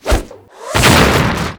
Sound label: hard hit